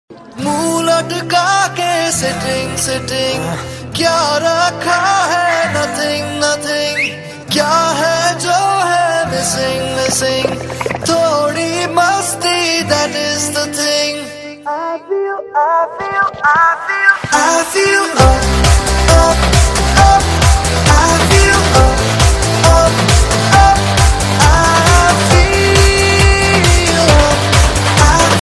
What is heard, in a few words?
Ad Tone, Ringtones; 7up